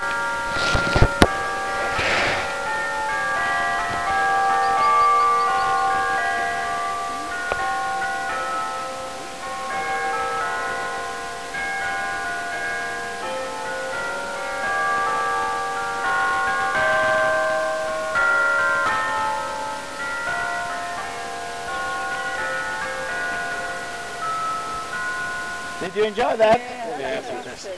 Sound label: church bells, madeira; madeira walking